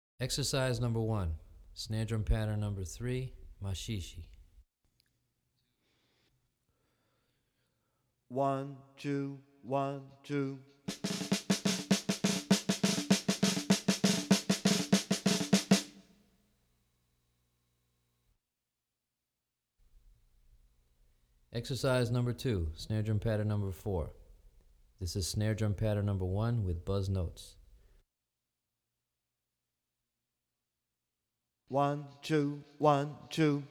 More Snare Drum Patterns for Samba; Brazilian Rhythm For Drumset; DaFonseca, Weiner

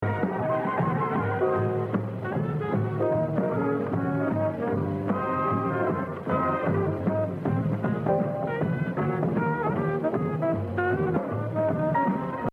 Exercise, jazz drumming; Exercise 12 Gene Krupa - 83%; The Evolution of Jazz Drumming (Gottlieb)

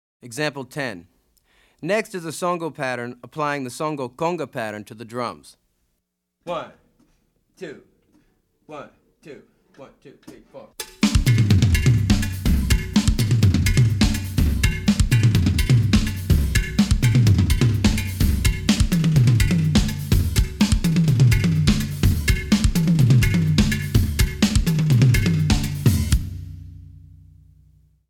Afro-Cuban Grooves For Bass And Drums; Afro-Cuban Grooves For Bass And Drums (Goines, Ameen); Drummer version

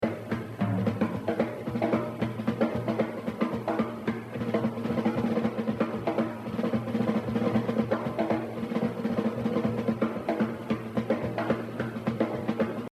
Jazz Drumming; The Evolution of Jazz Drumming (Gottlieb)

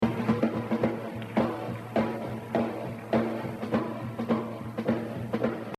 Exercise, jazz drumming; The Evolution of Jazz Drumming (Gottlieb)

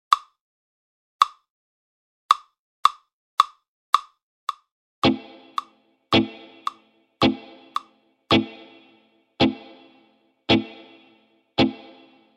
SKANK, Batterie Reggae; Buchmann; Batterie Reggae VIbrations

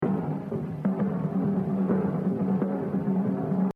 Exercise 8, Jazz Drumming - 70%; Gene Krupa; The Evolution of Jazz Drumming (Gottlieb)

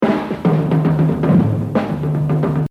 Exercise, jazz drumming; Joe Morello; The Evolution of Jazz Drumming (Gottlieb)

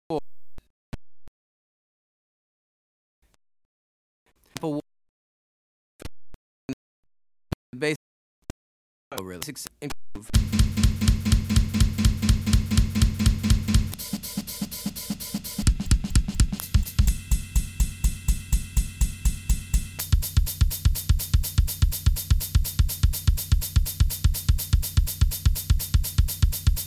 Afro-Cuban Grooves For Bass And Drums

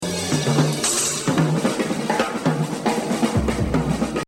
Jazz Drumming

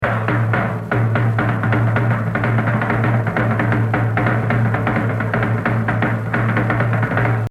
Solo, Jazz Drumming; The Evolution of Jazz Drumming (Gottlieb)

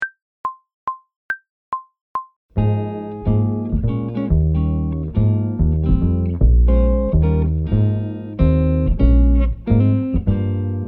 Jazz Waltz 140bpm, Brushes; Phrasing and Freedom with Brushes

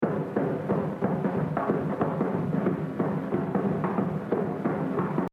Exercise, jazz drumming; Exercise 17 Gene Krupa - 100; The Evolution of Jazz Drumming (Gottlieb)

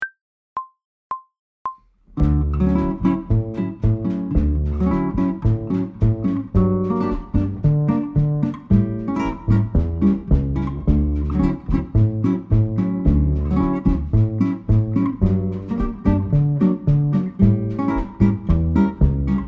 Bolero 110bpm, Brushes; Phrasing and Freedom with Brushes

